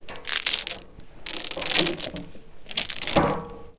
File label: Heavy Chain Moving